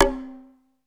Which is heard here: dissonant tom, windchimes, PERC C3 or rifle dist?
PERC C3